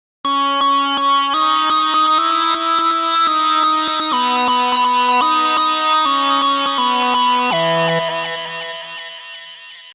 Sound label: Synth Lead